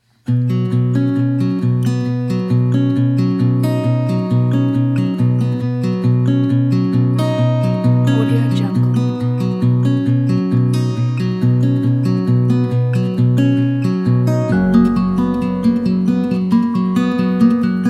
inspiring guitar

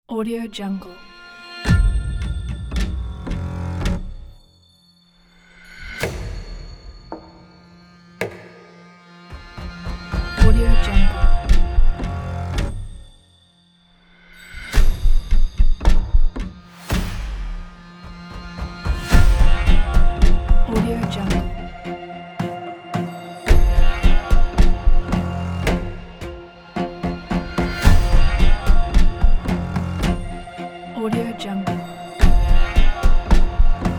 scouting intro trailer

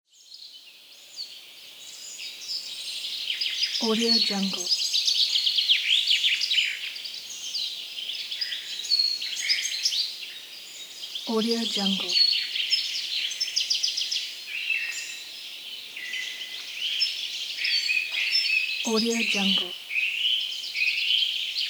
morning birds

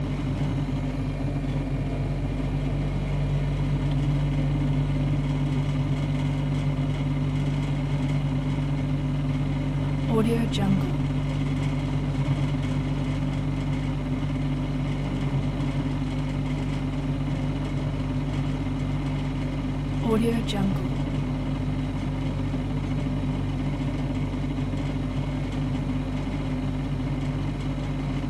household fridge old compressor run loop; blazznet

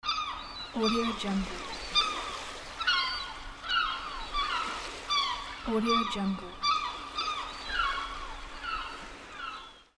seagulls

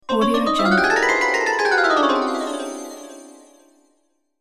magical celeste

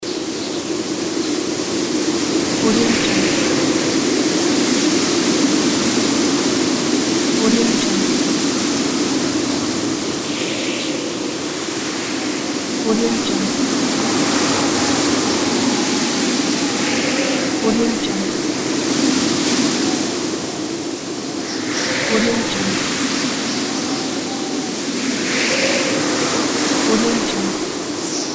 winter wind loop

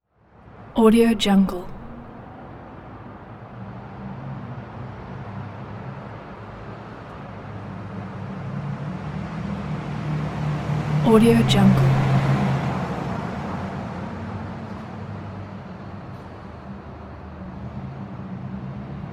parking garage ambience; chicasound

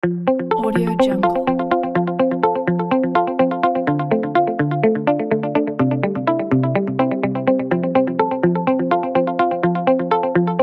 background corporate

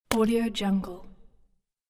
muddy pat

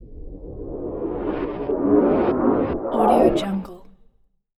time reversal

